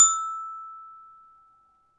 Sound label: Metallophone E Note